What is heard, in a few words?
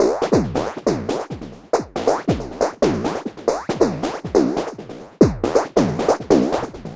Snare Loop, Drums, Dance Beats